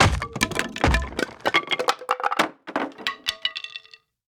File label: combat atmosphere; World of Tanks